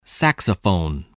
saxophone

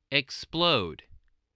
explode

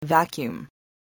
vacuum

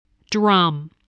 drum

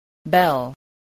bell

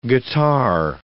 guitar

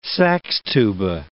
saxtuba

